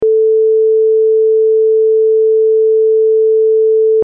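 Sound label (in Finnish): vibraatto originaali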